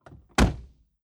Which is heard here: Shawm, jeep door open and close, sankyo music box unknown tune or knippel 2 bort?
jeep door open and close